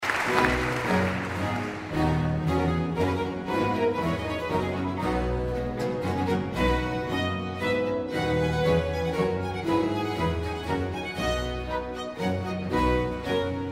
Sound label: Hornpipe; enregistré en concert à Poznan, Février 2004